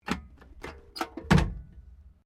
CAR HOLDEN HD PREMIER GLOVEBOX OPEN, Classic Australian cars